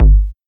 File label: 00s subtle reverb steel kick drum sound g key